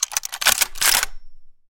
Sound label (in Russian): zvuk razryazhennogo avtomata